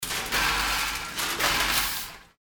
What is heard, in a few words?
candies filling machine